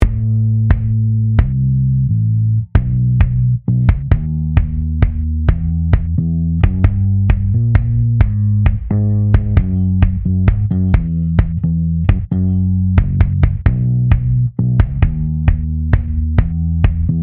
sidechain bd bass

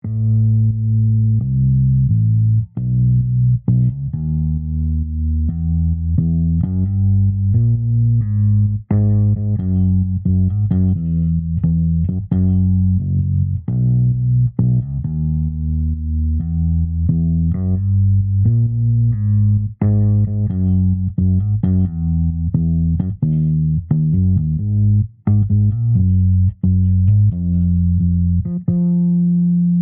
sidechain bass

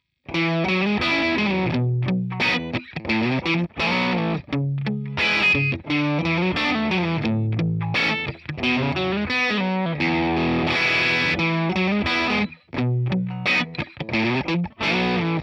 crunch phone neve

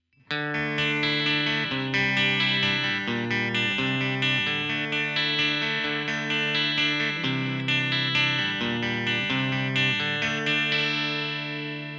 clean spdif

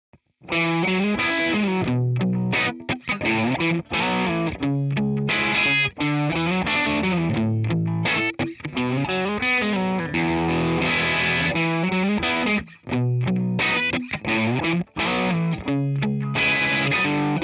crunch spdif

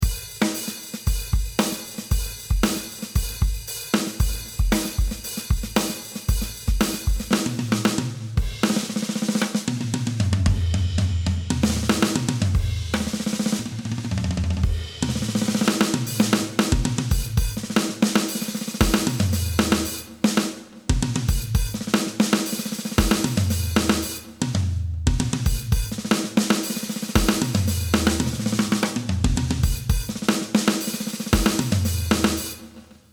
drum mix

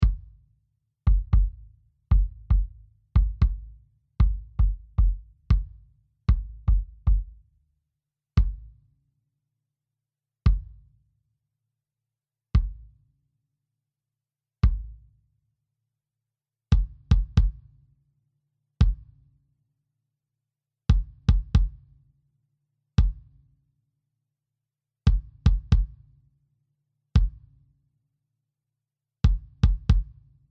kick wet

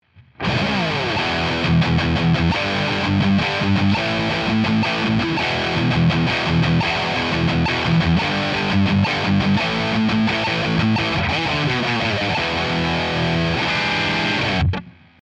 gain spdif

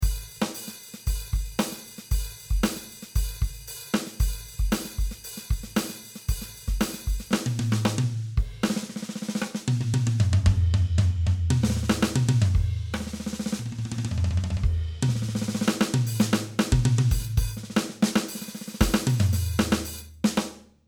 drum dry

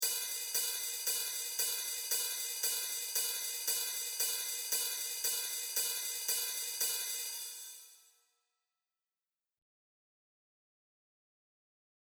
hihat wet

